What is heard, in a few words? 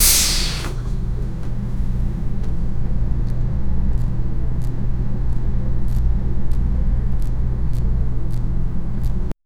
a step sound using boot; sound effect